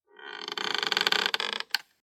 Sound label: creak